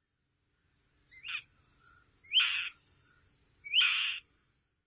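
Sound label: Black-winged Kite; ishigaki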